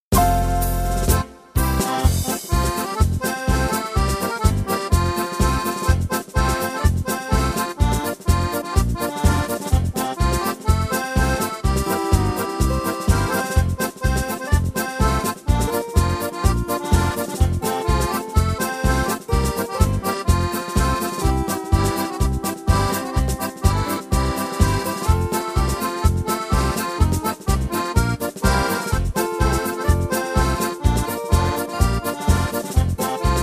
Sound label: pretty girl from omagh with steel guitar, square dance